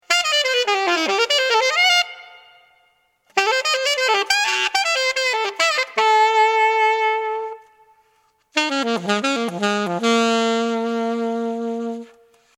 Alto, Ceramic; Drake

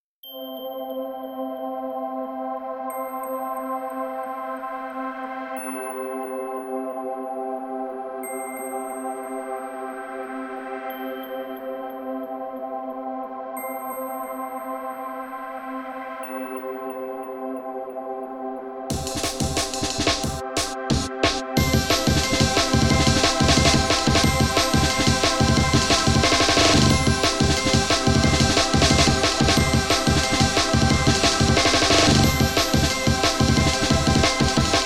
battle

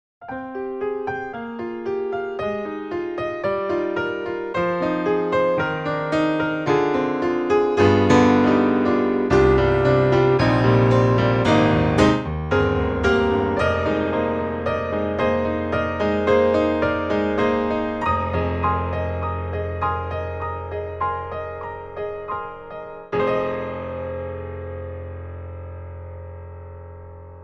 grand, piano; MD Player

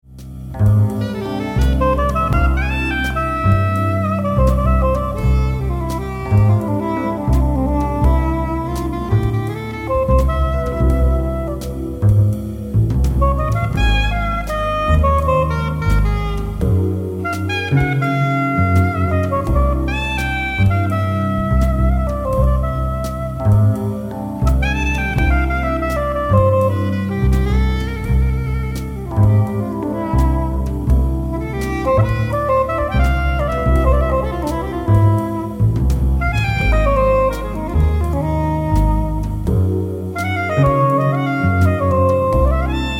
Song Sop

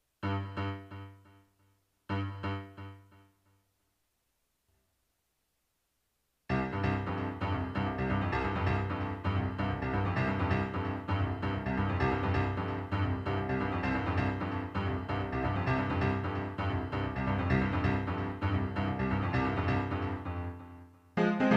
memory piano delay time

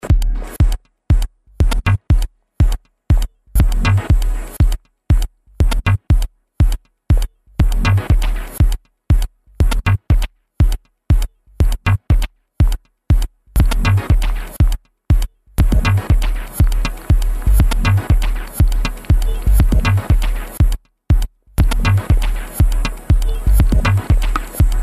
dub echo euphoria filtered scene

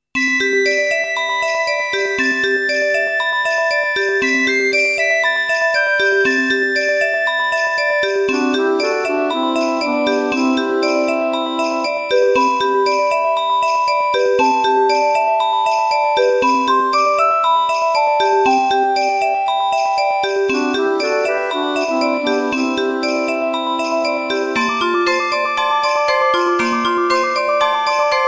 quiet forest

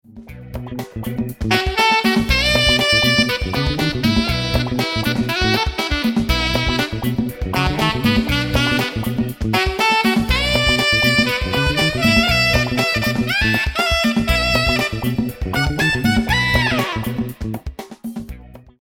Funk Alto S3 Saxz155

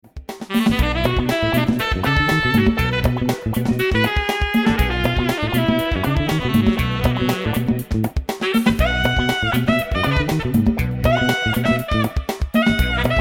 Funk; Marmaduke SC100

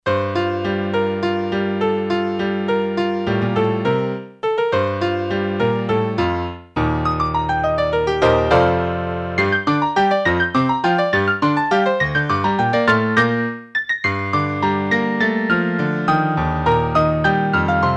piano still

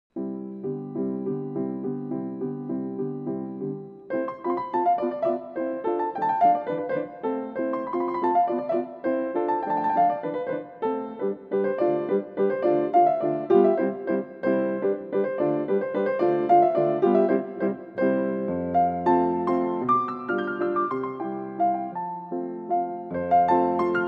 Chopin Mazurka C-dur

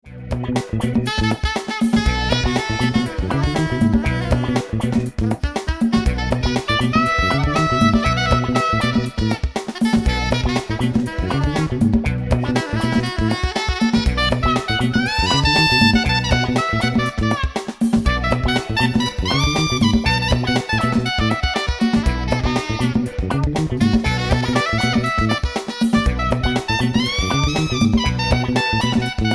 Funk; Sel Metal F

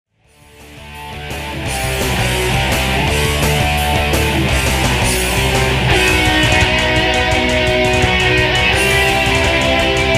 solo, guitar instrumental musics

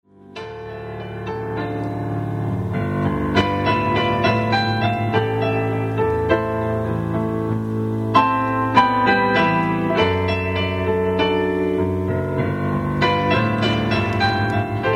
p solo

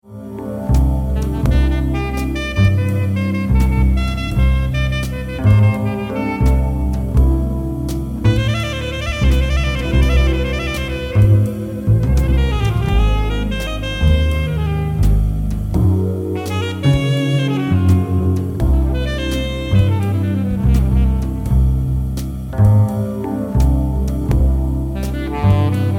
Song Alto

